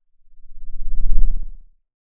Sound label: Sound of a lion’s roar